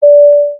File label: Ding Dong